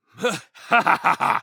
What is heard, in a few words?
Warrior Grunt Fast; Realm Royale; Lord Of Darkness